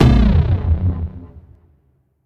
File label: fire; smite; raijin